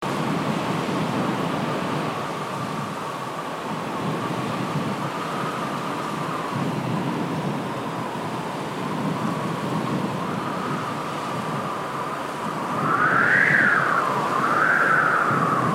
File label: ambient wind hollow; bgm